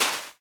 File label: sand walk, step; blocks; Minecraft; Remastered